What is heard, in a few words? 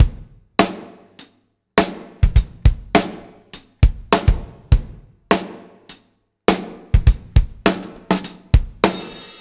BACKBONE, Backbeat